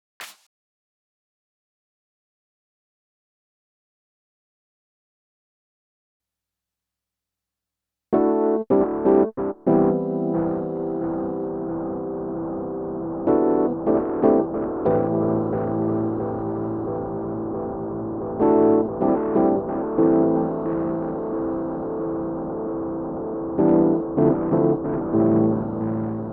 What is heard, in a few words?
Keys